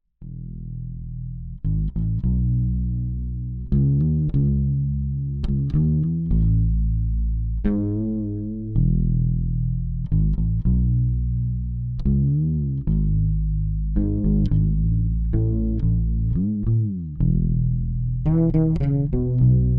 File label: Fretless Chorus Groove